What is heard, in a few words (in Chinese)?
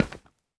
骨块踩踏声